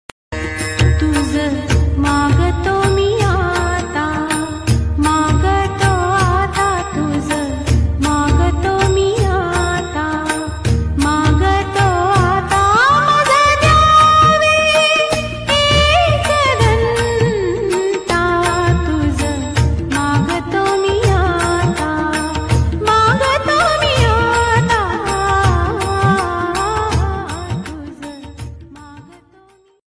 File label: ringtone